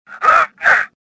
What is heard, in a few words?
choke; Star Wars Jedi Knight II Jedi Outcast; XBOX; stofficer